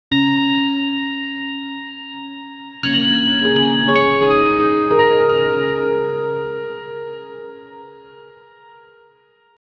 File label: start with a single clear, acoustic